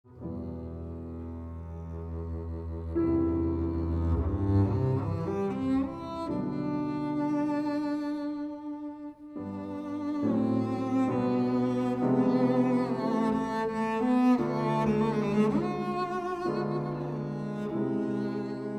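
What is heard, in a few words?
Melodia, double bass; Bottesini; Rossini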